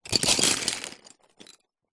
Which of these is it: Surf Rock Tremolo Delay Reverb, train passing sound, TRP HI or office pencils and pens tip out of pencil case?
office pencils and pens tip out of pencil case